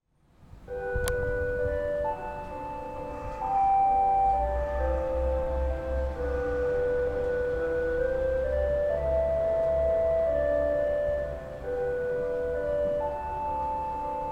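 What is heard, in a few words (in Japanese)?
toki no oshirase hiru; 時のお知らせ（上諏訪・昼）; kamisuwa; 茅野市民館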